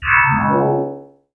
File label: Pickup Magic; Pickups